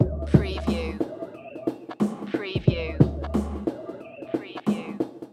Hip Hop Drum Loop 90 BPM - Swing - Lo-Fi

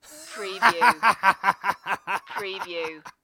Laugh Male, Laughter, Laughing Male

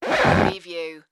creaky floor

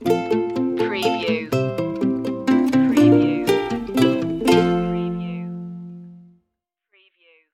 we wish you a merry christmas ukulele stinger

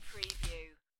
Dice Grab Collect Two Dice From Felt

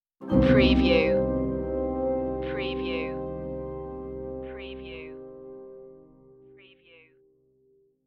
silent noir stinger

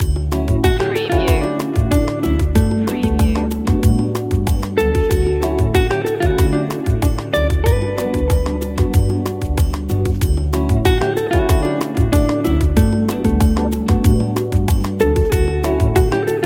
smooth bossa nova loop

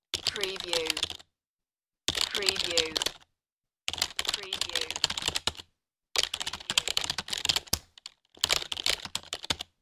Computer Keyboard Typing Fast

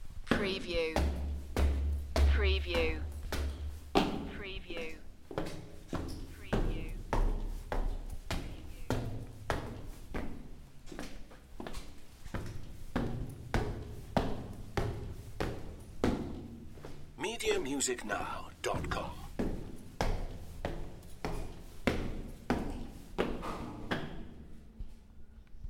Footsteps Stairway

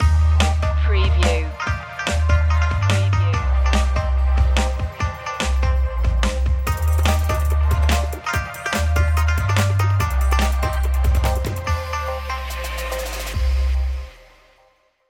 india charm

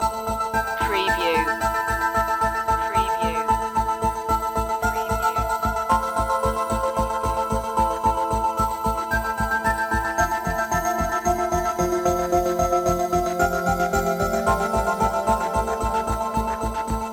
pulsating lights loop

